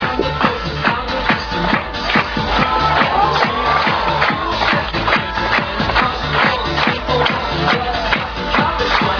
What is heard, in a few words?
circus